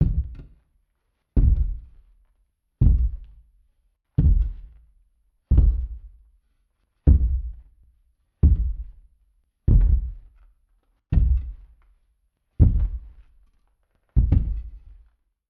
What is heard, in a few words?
Monster Giant Footstep; HauntedBloodlines